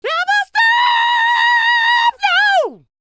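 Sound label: falsetto, R&B Dance; Spectrasonics Vocal Planet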